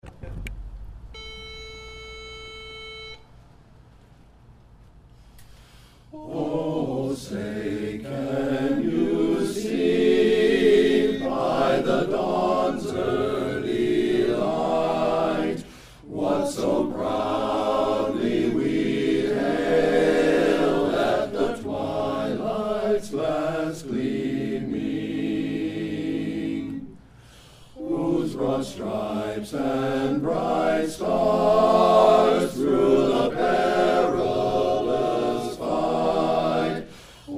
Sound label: Chorus